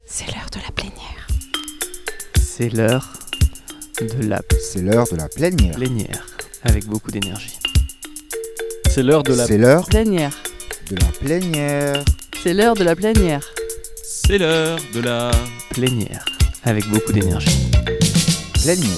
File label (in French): JINGLE PLENIERE; réseau des CREFADS; Lathus St Rémy (86)